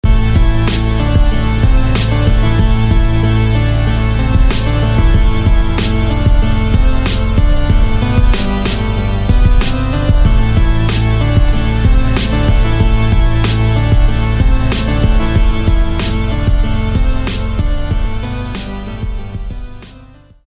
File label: Mayhem Synth; SFB FX